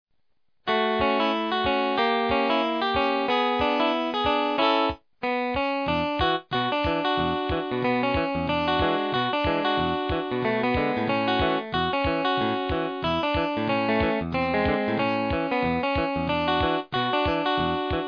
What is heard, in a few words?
Clean Guitar